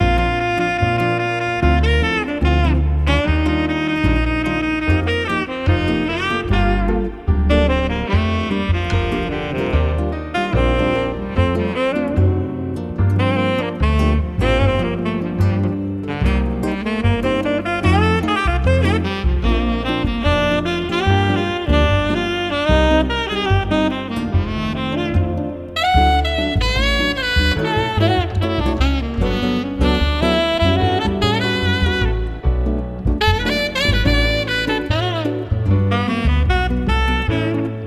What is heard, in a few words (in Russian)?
Офисная Фоновая Музыка